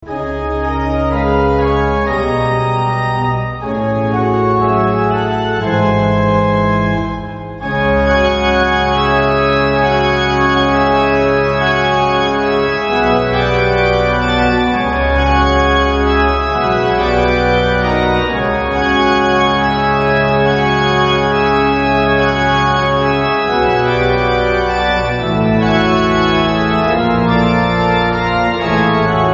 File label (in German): nun danket alle gott orgel